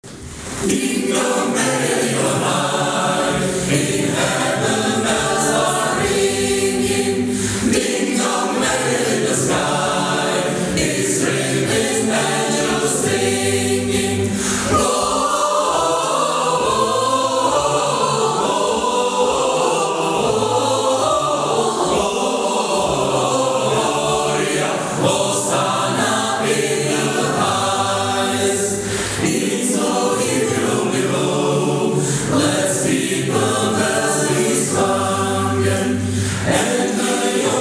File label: DingDong; Lodenice